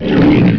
pain, ogre; svencoop; legends

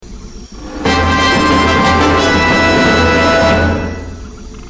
drum; svencoop; gruntsparty

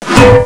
wrench hit; svencoop; legends

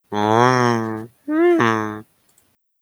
cow death; svencoop; cow tycoon; gonome replace

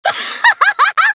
laughter; svencoop; player sounds; lappland

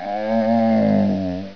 idle, zombie; svencoop; quake1; monsters

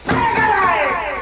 pain; svencoop; cracklife

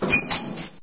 door move; mistake coop

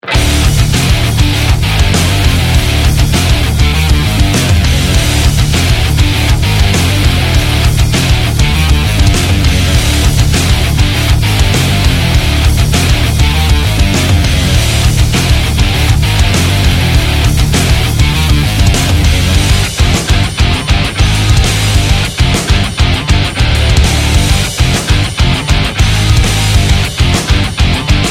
neorock loop; svencoop; uboa rampage ii

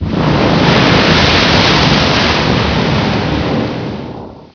rise; monsters; svencoop; quake1; boss

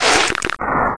baba attack; zelda

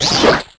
larva pain; zelda

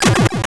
fly pack; svencontra2

